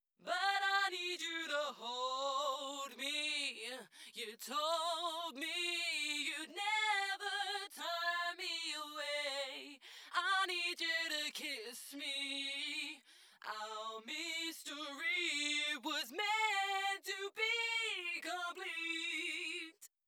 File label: Rev Alts Vox Widener Solo